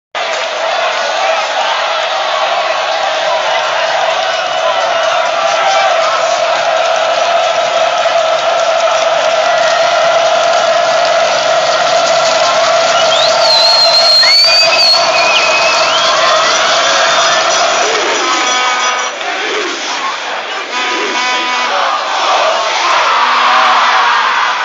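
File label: you sheep shagger, football chant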